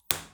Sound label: backhorn